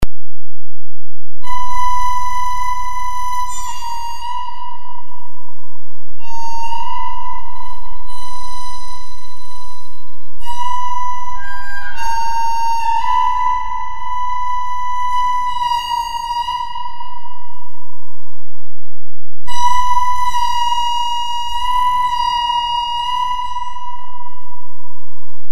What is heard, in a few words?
harp; Demonica